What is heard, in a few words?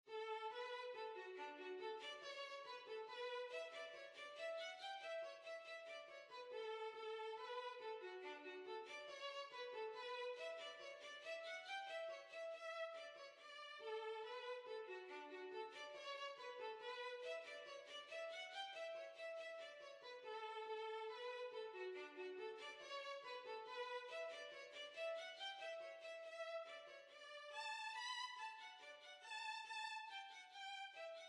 Reel, Violin